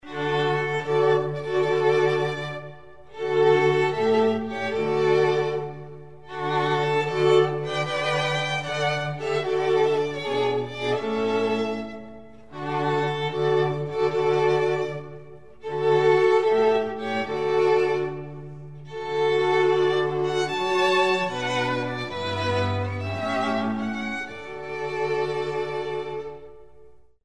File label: Bridal Chorus, String Quartet; Lohengrin Wagner